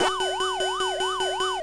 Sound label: pacman extra life; Scratch